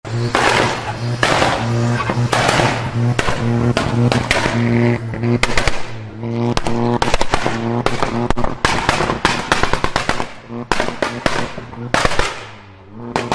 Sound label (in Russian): vyhl s vystrelami volkswagen golf gti